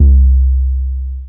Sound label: BASS 808 C; M8; M8JUNGLEPAK1